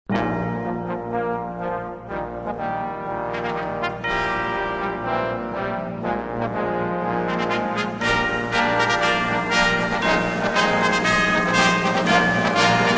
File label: Choir And Orchestra